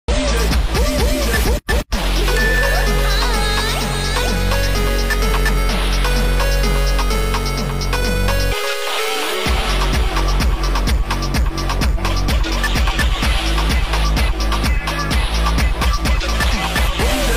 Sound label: remix song